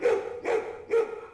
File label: dog; PC Gamer IT CD 49; UrbanChaos